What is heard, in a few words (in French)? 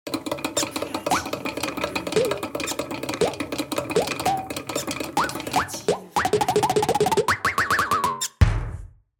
Beeping Soap bruitages